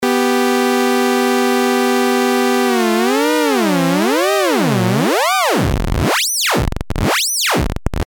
lfo

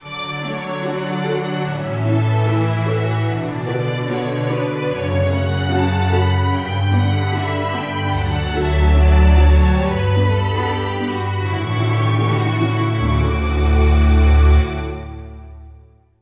Canon

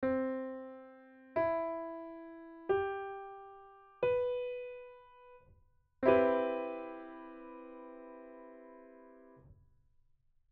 c maj7

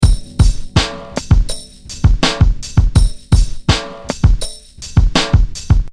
drums

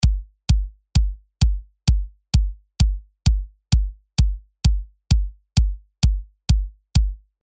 Kick HALion

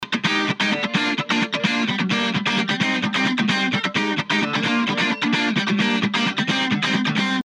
Guitar; Sabi

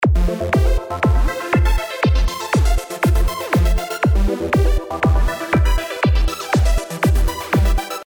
synth kick

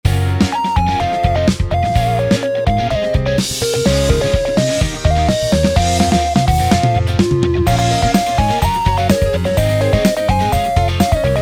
Drums melody

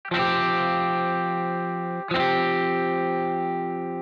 Clean

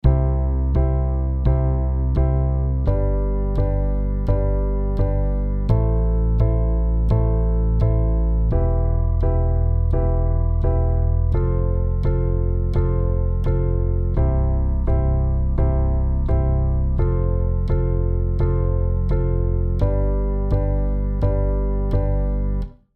Canon

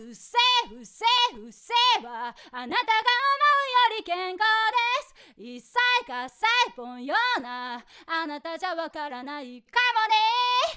AUTOTUNE

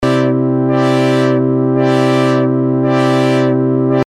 lfo filter

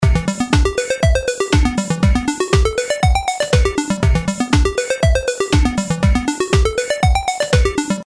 synth recipe

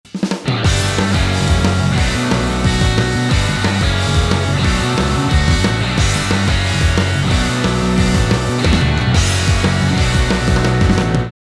Strum

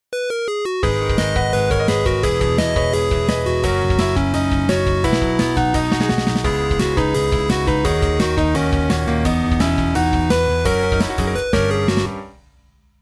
Piano

